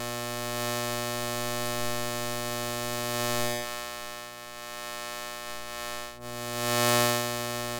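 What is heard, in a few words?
ambient hum buzz loop, electric; Half Life Model Pack; Half Life Alyx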